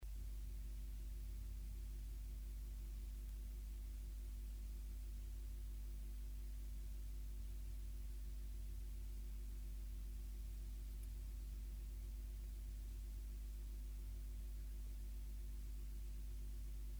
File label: 15k 820 opa piezo gnd